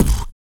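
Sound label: vox percussion, Vocal Planet Jazz FX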